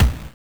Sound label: Kick, Genesis; Virtua Fighter 2